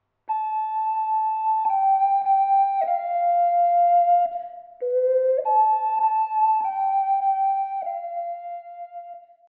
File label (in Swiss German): schlaf chindli, ocarina